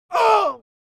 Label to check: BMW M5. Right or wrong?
Wrong